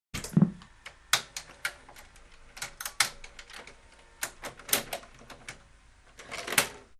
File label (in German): tv aus